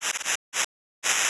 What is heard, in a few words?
matchover; GNU Backgammon